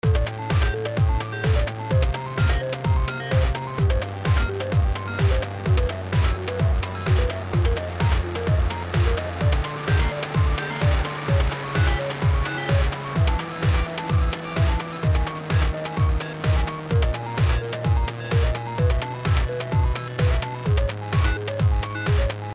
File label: RINGTONE